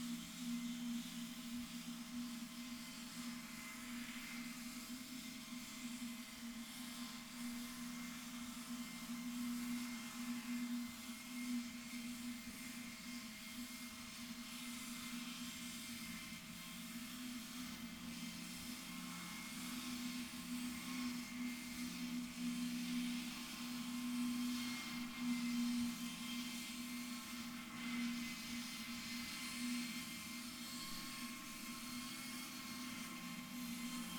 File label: rorquals negatif, Balaenoptera Physalus Chile